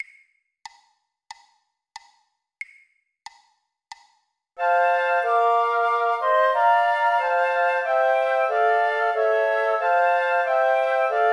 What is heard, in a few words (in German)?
Flöte Klavier; 92 bpm, 2x; Whilst Shephards Watched Their Flocks; weihnachten